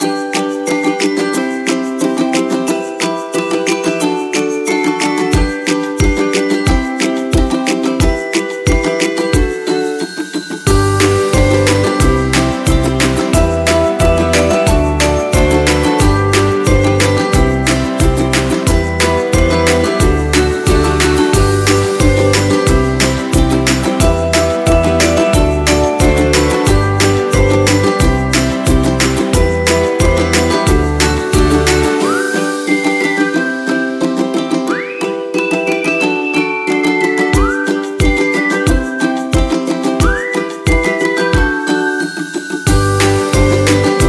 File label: Instrumental Happy Musics